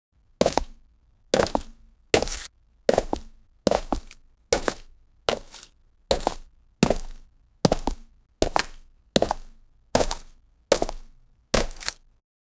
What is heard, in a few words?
like stepping, metal; minecraft